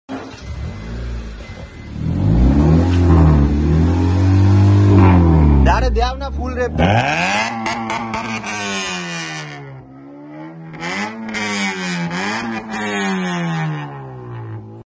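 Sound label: Honda Civic, exhaust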